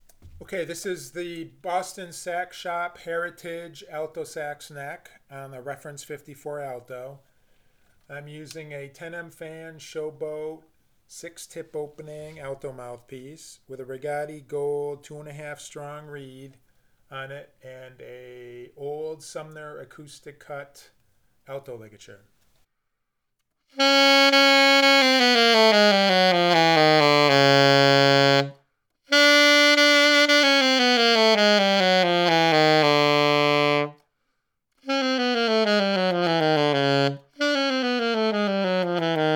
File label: Alto Heritage Neck Random